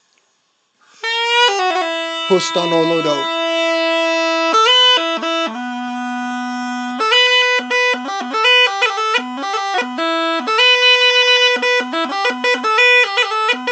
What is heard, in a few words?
kaba gajda; Rhodope Kaba Gajda